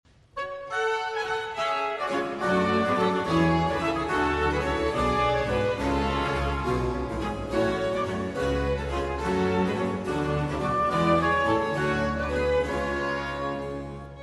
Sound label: semi-opera, First Act Tune, Jig; Henry Purcell; The Fairy Queen